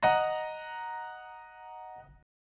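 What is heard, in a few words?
D#m higher, chords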